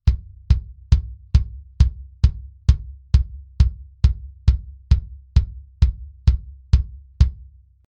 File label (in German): BD Mic Test Tech II solo dry